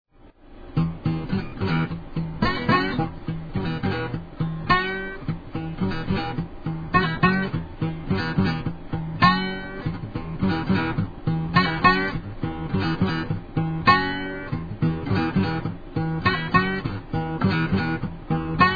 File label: Acoustic Guitar; Chicken Plicker